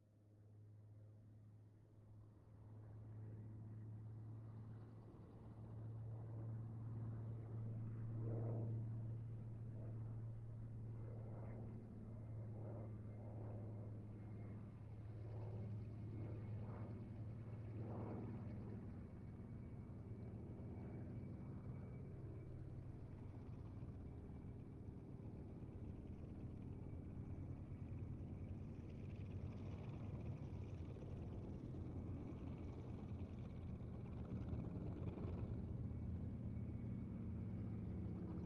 spitfire mk xiv ext landing passby mid runway following plane